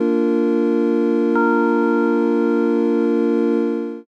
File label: church